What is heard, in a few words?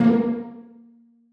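celli pizzicato a2; Sonatina Symphonic Orchestra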